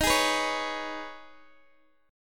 Eb 7th Suspended 4th Sharp 5th Eb, Guitar Open G; x,x,13,13,12,11; down

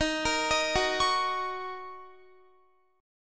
Eb Minor 7th Eb Guitar; Ebm7 arpeggiated

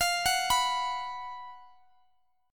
B 5th F, chord; B5/F Chord {X X X 22 19 19} arpeggiated

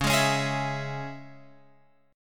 Db Minor, Guitar Open F; Dbm strummed; JGuitar

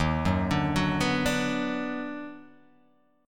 D sharp Minor Major 7th Sharp 5th

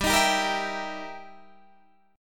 E Minor Major 7th Flat 5th, G

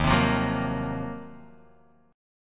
D sharp Minor 6th Add 9th, Guitar, Open D; 1,3,3,0,1,x; chordshape

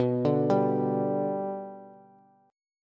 B-Minor Sharp 5th, Bass; arpeggiated; JGuitar

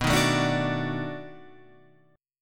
B 7th Sharp 9th, down, Guitar, Open F; strummed

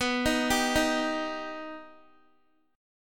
B Augmented B down Guitar; arpeggiated

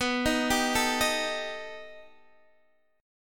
B Augmented 7th B down Guitar DADGAD

